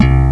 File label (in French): Basse